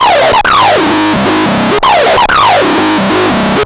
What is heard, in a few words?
Analoop, Industrial; Analog; Analoops; Sonic Foundry (Sony Creative Software)